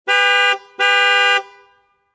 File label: car horn twice